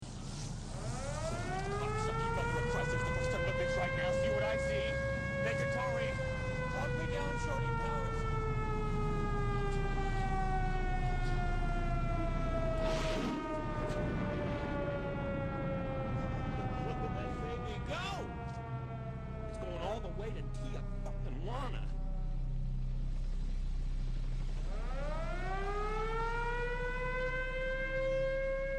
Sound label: Sound The Alarms Instrumental